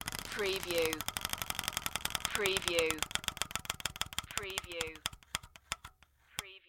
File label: Paper Hitting Wheel Spokes; Wheel of Fortune